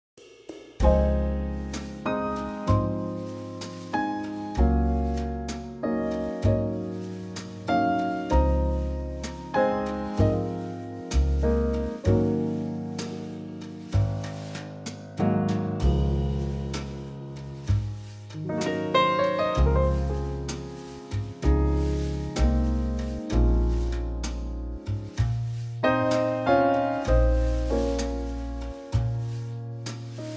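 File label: jazz ballad Db, backing track; Trio; key - Db - vocal range - Eb to Ab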